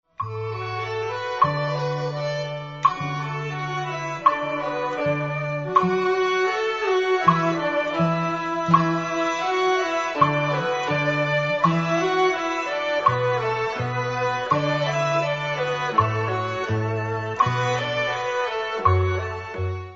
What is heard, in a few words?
strings